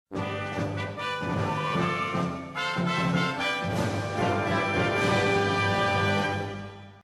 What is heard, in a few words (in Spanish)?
Tema de victoria; Pokemon; SSBM